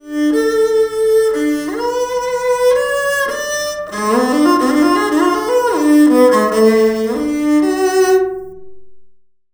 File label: violin